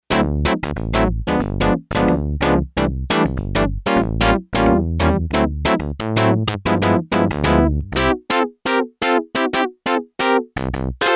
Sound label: Clavi Brass, Yamaha TX802; Factory Patches; Voices